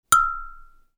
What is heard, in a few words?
UI Quirky